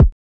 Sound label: Kick; Them Ones